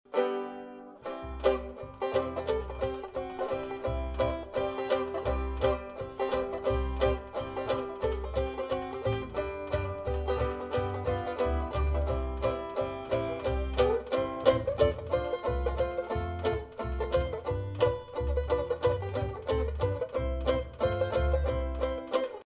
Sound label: Tavern